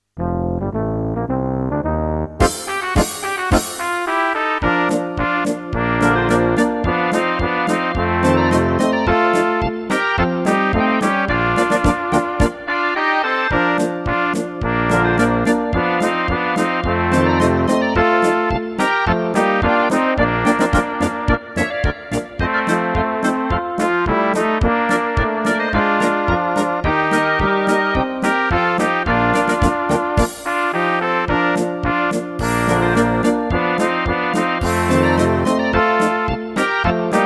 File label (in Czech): Polka pro dva, MIDI